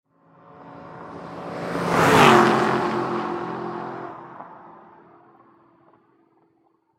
Auto Aston Martin Pass By High Speed Automobile Car 2008; Vehicle; Cars